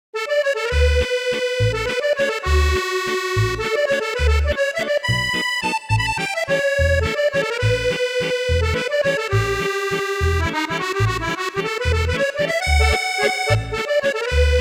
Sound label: VALSE